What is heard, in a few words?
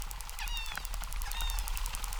Chirp, acquarium